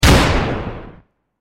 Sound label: loud shoot